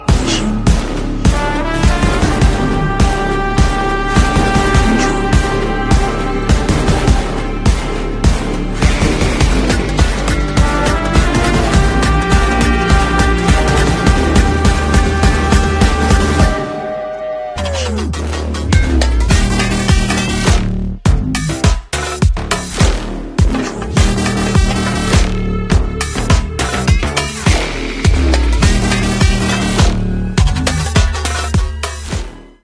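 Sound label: background music ringtone; GEORGE MAMMOOTTY INTRO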